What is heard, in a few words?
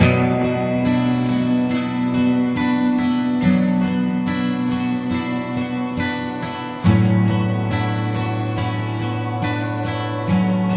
guitar, Loops, Sad